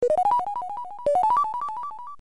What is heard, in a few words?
Coin; DKC; DK Hero